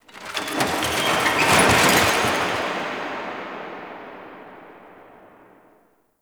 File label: Stadium Metal Crash; Warframe; Ambience; Nineteen Ninety Nine